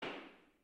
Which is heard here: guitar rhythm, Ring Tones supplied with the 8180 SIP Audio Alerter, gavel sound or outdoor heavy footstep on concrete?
gavel sound